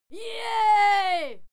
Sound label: female cheer yay; schreie; menschen